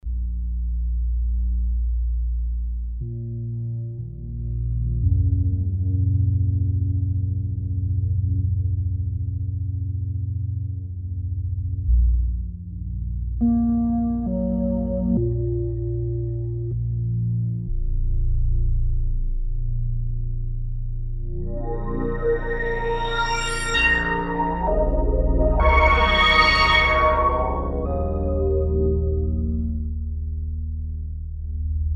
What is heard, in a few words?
pad rumble, minimax; Creamware minimax